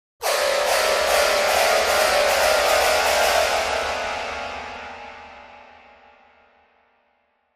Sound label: Piano Strings, keyboards piano